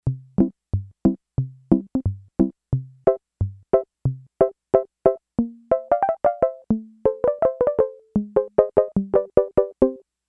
Pizz. 1, Yamaha DX11; Factory Preset Voices

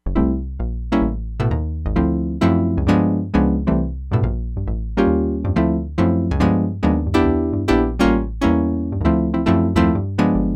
SQUARE PIANO BASS, Crumar Bit 99; Factory Programs

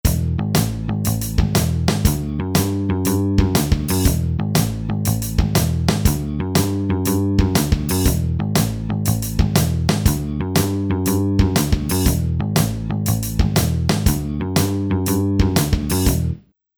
Pop

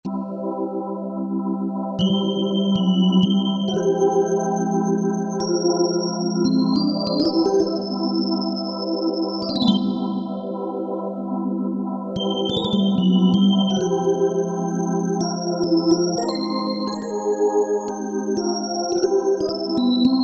Jazz Split Organ

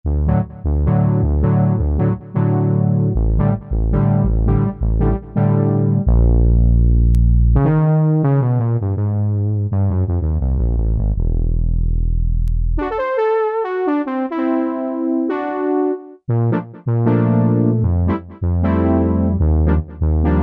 Synth Fretless

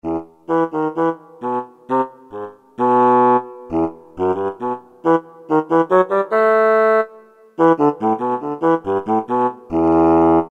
BASSOON; Roland SN-U110-06

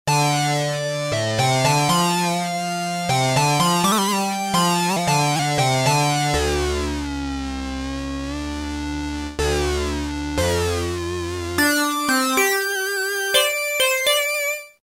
Sync Lead; Roland M-DC1

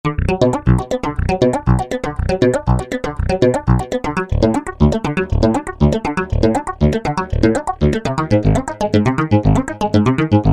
Percolator, Roland V-Synth; Factory Patches

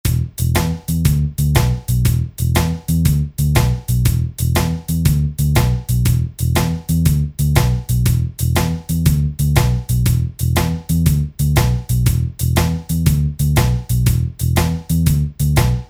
Blues

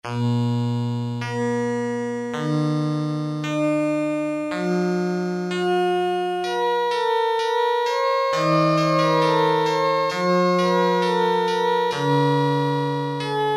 synth. sound, Casio MT-500; Tones

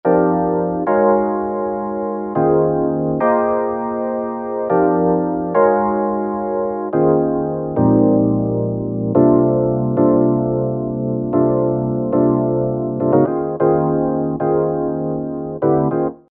1 key jazz; House; Roland SR-JV80-19 House Collection

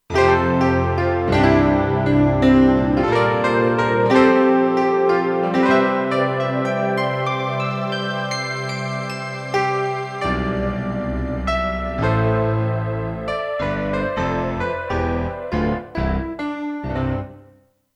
E Grand Stack, Kurzweil K2500X; Factory Programs

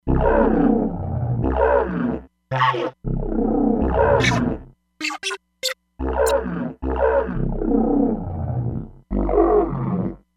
Didjeridu, E-mu Proteus-3; Factory patches

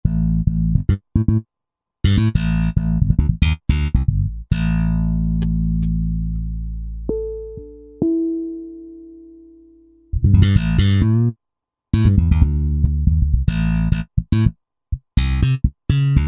Dynathumb, Bass; Roland SR-JV80-10 Bass & Drums

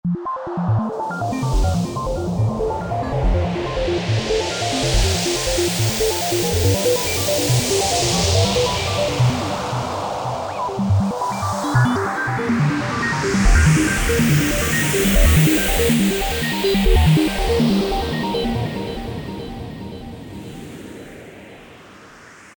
Water Edge, Korg MS2000; Factory Patches

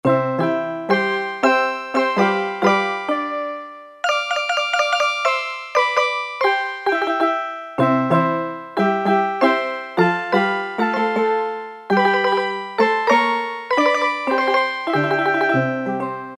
Steel Drum, Roland D-110; Factory Patches

